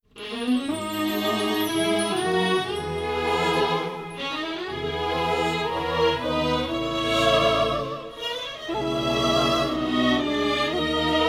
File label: Anthem